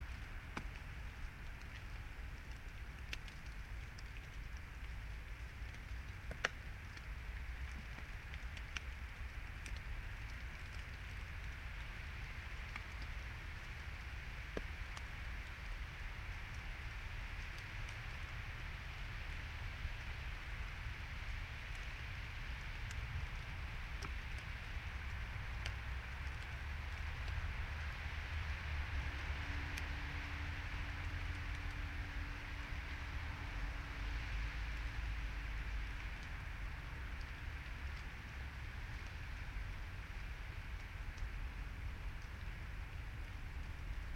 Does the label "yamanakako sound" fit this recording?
Yes